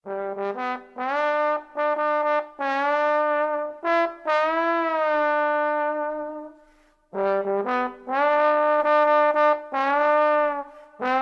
Trombón

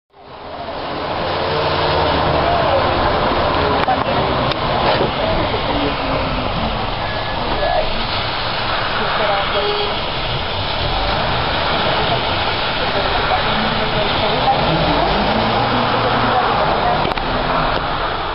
RUIDO

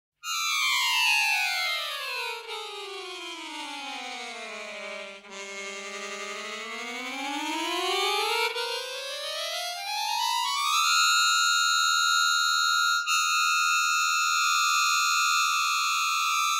Armónica

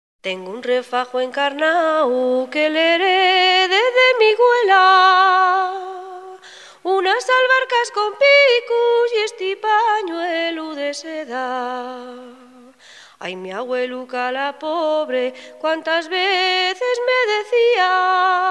campurriana

